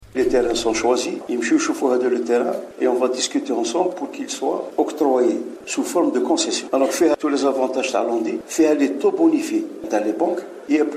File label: guit